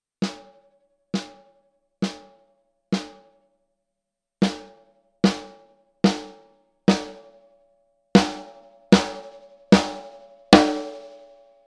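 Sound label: Gretsch Bell Brass mid, snare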